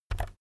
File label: keyboard external key press very fast soft